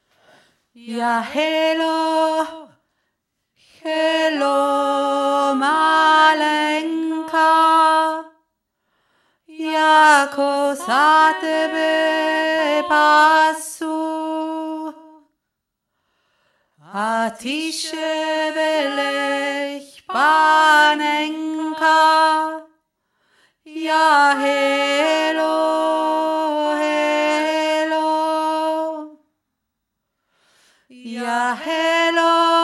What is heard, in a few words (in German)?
ja helo malenka mittlere stimme